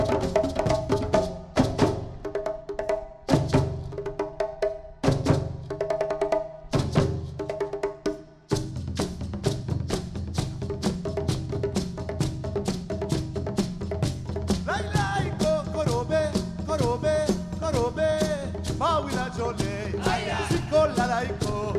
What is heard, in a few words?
drum Song; World Music